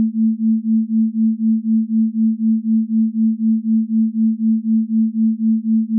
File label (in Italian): battimento binaurale; il suono è stereo